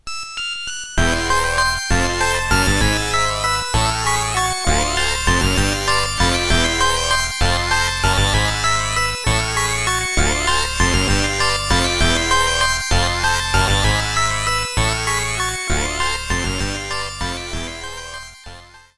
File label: Phase Distortion Resonance; Squeedo; Super Mario Kart